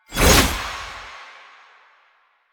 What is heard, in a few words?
Gunbrella Aim Transition In, Weapons; Warframe; Tenno; Koumei